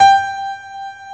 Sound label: PIANO G5; PIANOS